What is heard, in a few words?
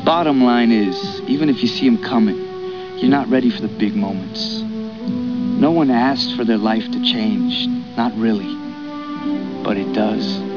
whistler